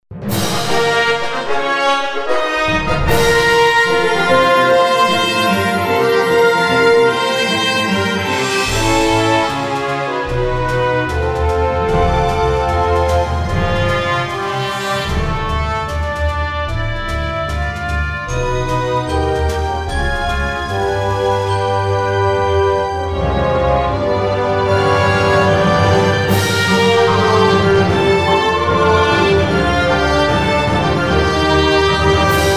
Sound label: Rebel Victory; Star Wars Empire at War